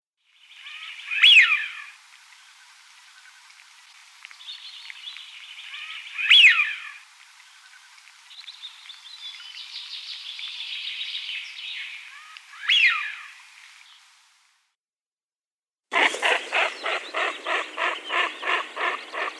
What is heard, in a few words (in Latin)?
Anas penelope